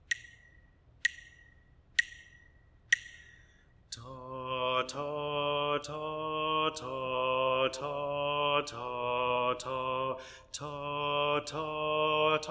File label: partially completed melodic dictation